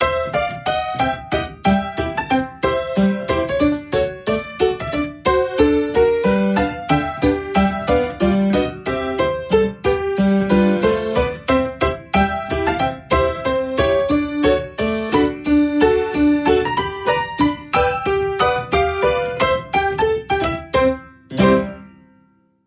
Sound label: Saloon Piano